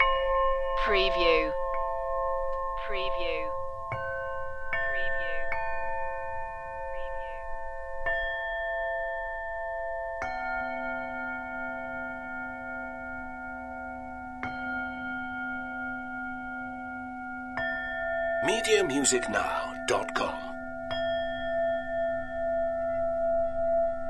tibetan bowls meditation loop

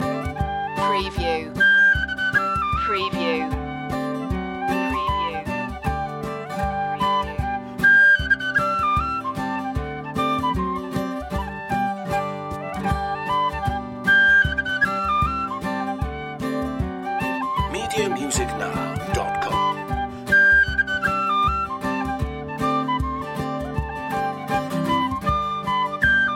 hornpipe

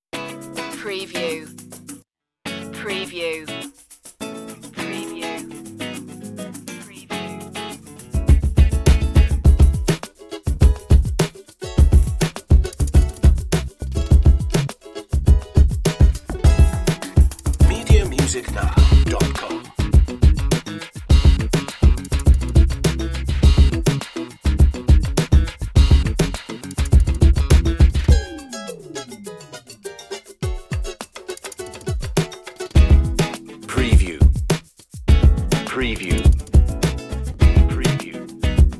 samba hip hop

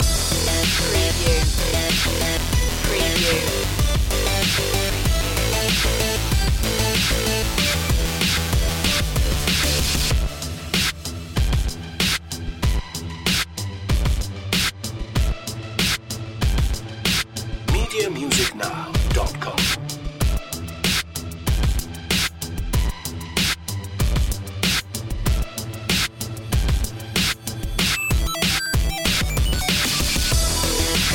8-bit fat groove long looping

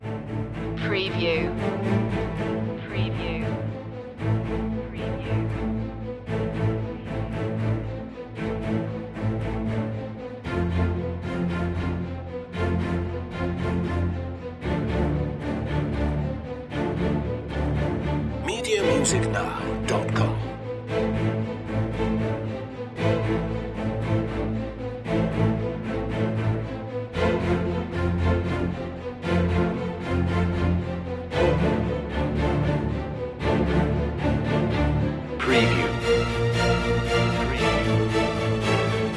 strings mix low intensity

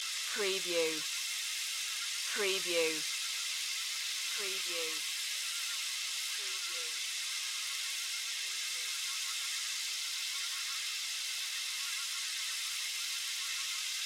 Ambience Jungle Night Loop

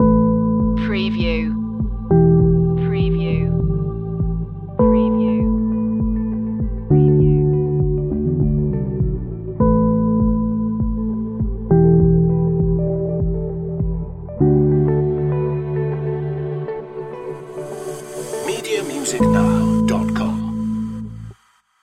groovy meditation stinger

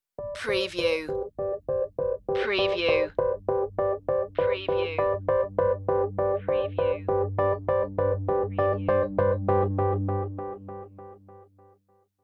scientific discovery stinger

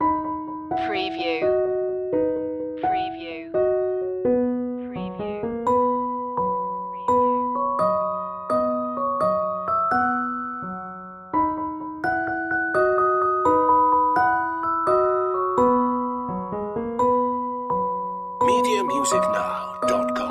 row row row your boat instr

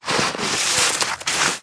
Snow Dig With Hands; sound effects bible

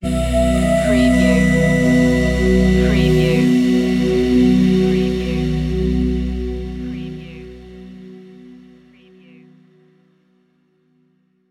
web ident

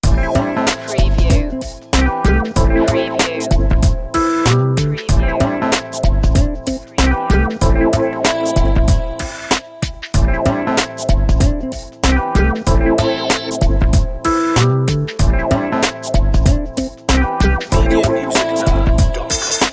funky summer

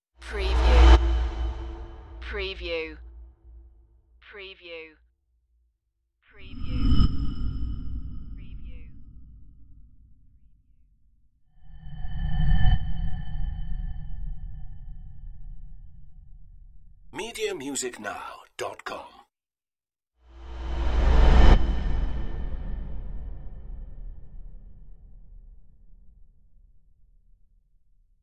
scary whooshes

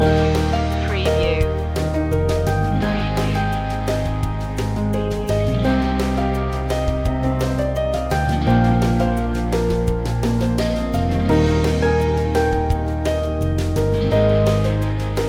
lovely landscapes loop

